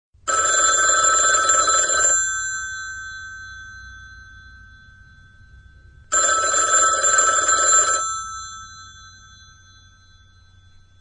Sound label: Old Tele